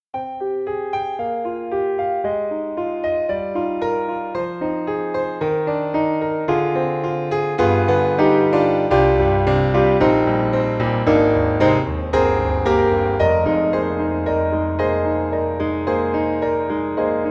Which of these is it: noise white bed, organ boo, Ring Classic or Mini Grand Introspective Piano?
Mini Grand Introspective Piano